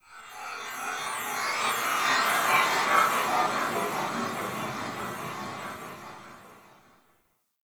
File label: Chime Texture; Warframe; The New War; Zariman; Apartment; Scene Four